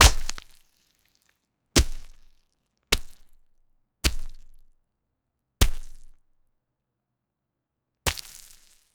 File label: two thick organic flesh, gore